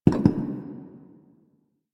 Foley Globe Light Set Down; Zariman; Table For Two

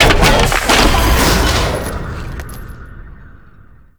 Loot Crate Open; Tau; Tau Circles Of Hell; Loot Crates

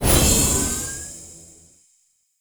Glyph Tower Activate; Duviri; Glyph Puzzle

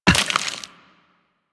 Walk Bone, Footsteps; Warframe; Operator

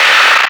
Screen Flicker; Warframe; Duviri; Easter Eggs

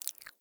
Foley Mouth Move; Enemies; Warframe; Catbrow

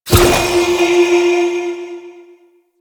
Spirit Primary Fire B; Warframe; Grineer Thumper Bot; Sentient Spirits

